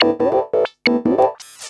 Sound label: TECHNO SYNTH 140; Keys